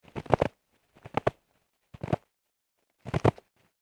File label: Packing Snow; Snow Sound Effects; weather